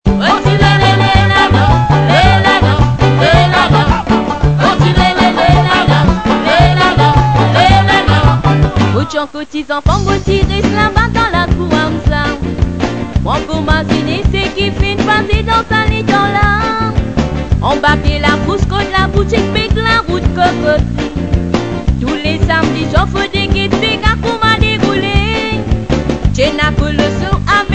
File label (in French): tambour; Zotsa Kan